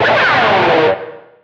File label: Pickup Guitar Scrape; Pickups